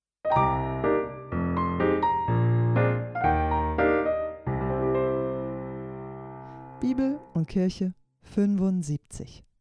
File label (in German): Jingle; Bibelwerk